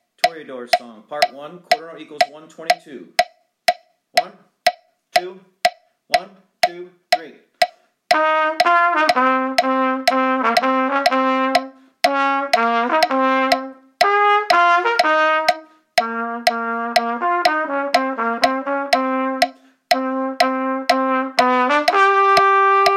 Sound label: Easy Duets, qn=122, lessons Trumpet